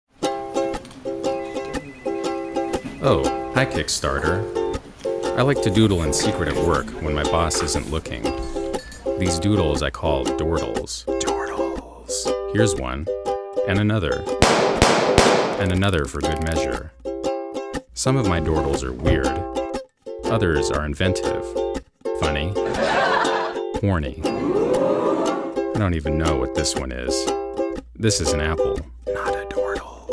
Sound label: kick